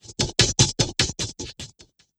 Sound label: Strobe Transition